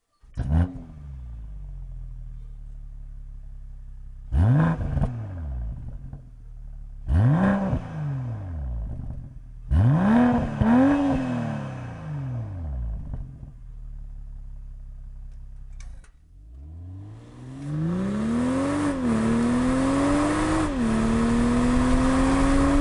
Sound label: akra soun 440i